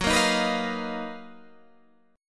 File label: B Major Sharp 11th Gb